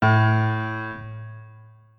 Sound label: piano A2